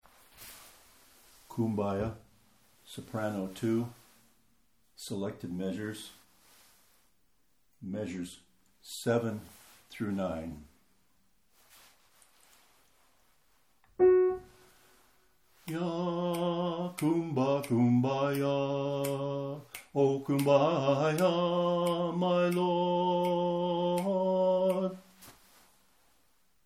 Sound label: Kumbaya Rythym Soprano